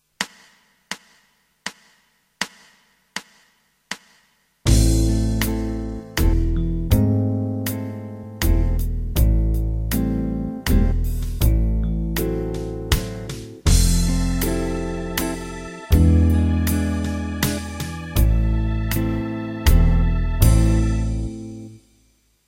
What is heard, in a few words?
E dotted minims